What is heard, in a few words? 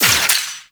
Zap Trap Rolling Attach; Trapper